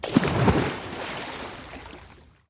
splash; rise magnetfishing

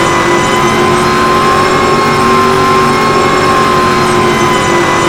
high, engines; DEMON

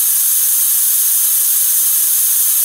turbo, vehicles

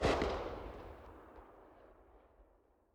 p90 rev; weapons

